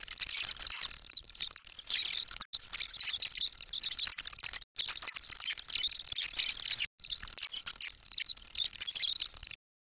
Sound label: mechanical cybernetic bir